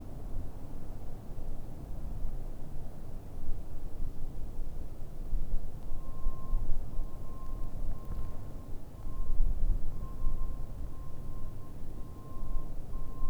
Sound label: paranal exterior day, soundscape